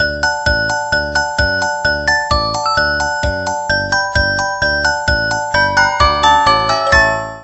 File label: ringtone